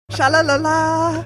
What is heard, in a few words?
jen singing sha la la